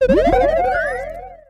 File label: Robot Confused Balanced